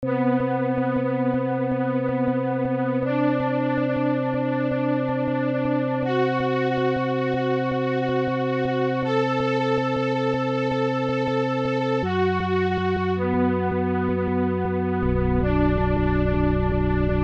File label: string progression minor